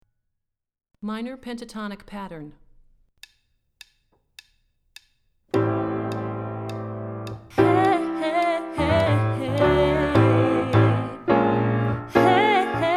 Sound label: Minor Pentatonic Pattern Low, warmups